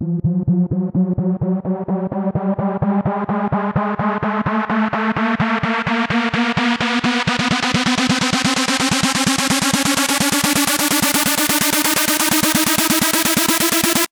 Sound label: Tonal Riser 128 BPM F; EDM